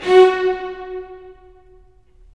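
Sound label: STR, strings; Roland - LA Composer vol. 4; Full Short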